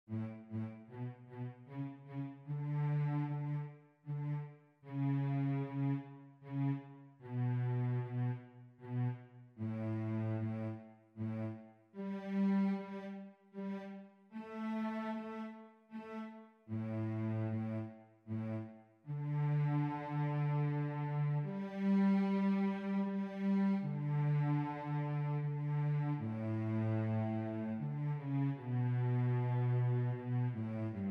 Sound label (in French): violoncelle